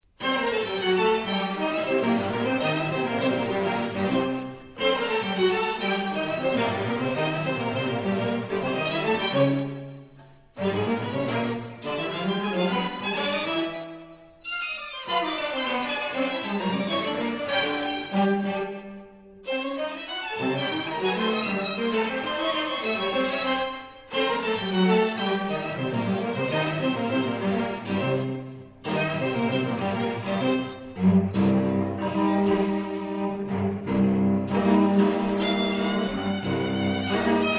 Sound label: Quartet